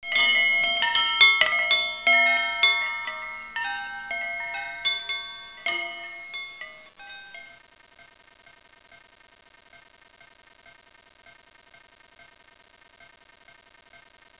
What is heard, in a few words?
chimes loop